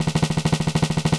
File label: Snare Roll; Sonic Foundry (Sony Creative Software) - Rondo Brothers Trip-Hop Loops & Samples; El Corazon